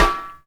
Warm Sounding Snare Single Hit D Key, Royality free snare one shot tuned to the D note; snare drum samples